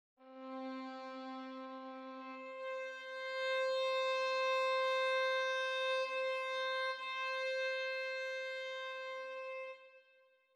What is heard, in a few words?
VI solo Non vib